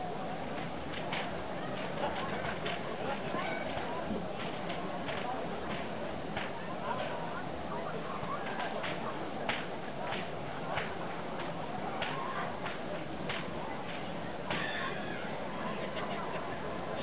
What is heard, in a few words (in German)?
schnalzen, jugend; Kirchansch